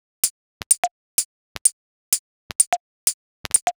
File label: Top C# 127bpm, House Drumloops; Zero-G House Fabrik Drumloops; ZG Drumloops